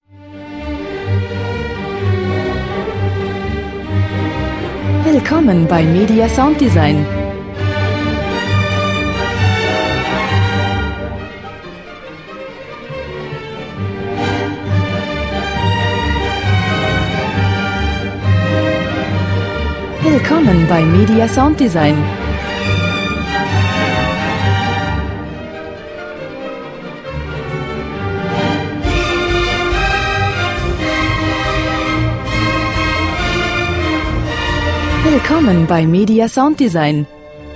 klassik ungarische taenze nr 5

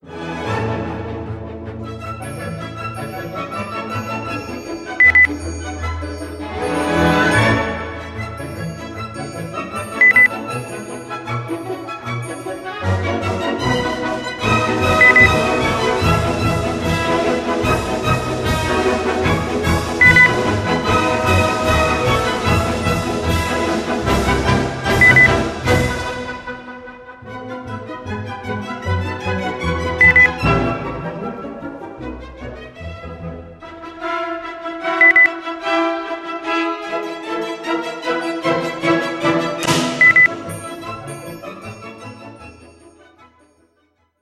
klassik polka

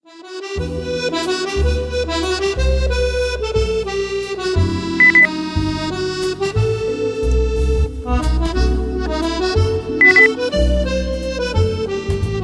paris beep

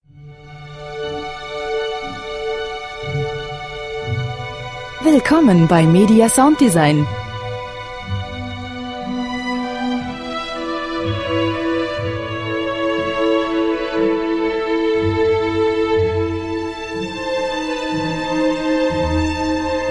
klassik

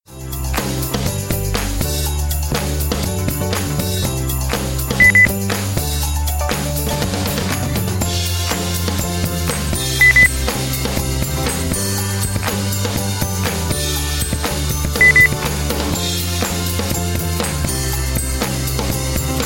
weihnachten happy holidays beep

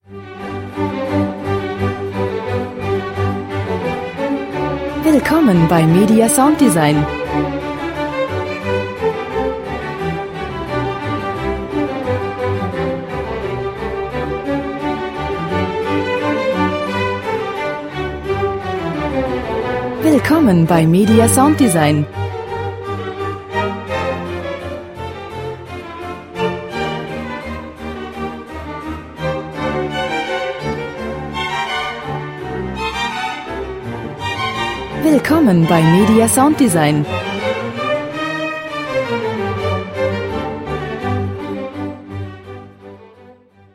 klassik brandenburgisches konzert nr 3

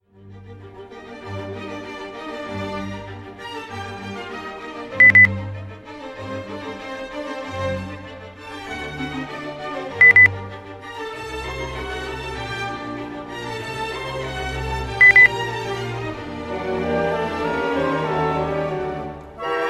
klassik

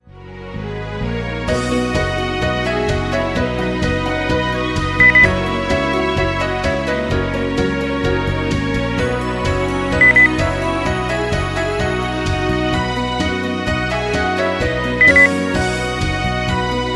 weihnachten lasst uns froh und munter sein beep

